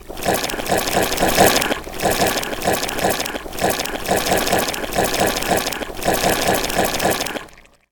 salad dressing; horror

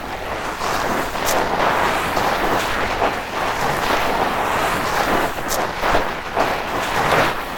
dragging; horror

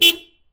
horn, car

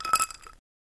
small ice

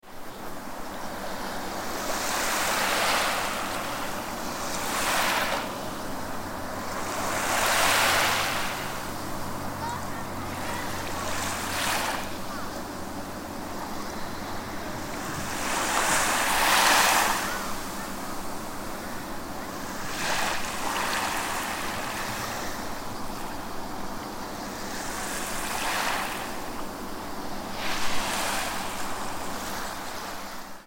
summer beach

